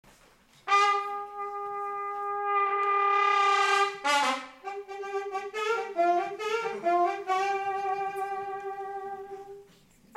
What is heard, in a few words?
Fanfare